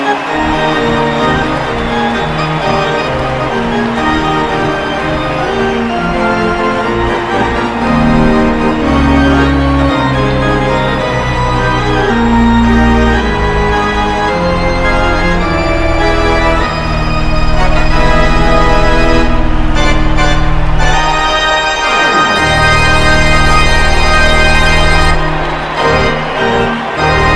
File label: Goal; St Louis Blues